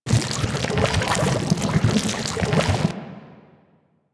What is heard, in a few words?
Gloop Loop; ambience; props; loops; Warframe; Venus Open World; Venus Landscape